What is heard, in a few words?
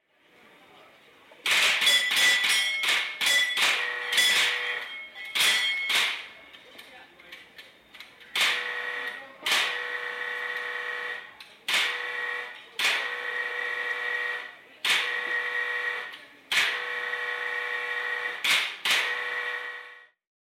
Shooting Gallery; SOUND EFFECTS1